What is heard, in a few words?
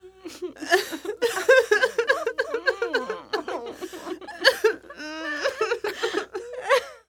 Human Female Cry Group Light Cry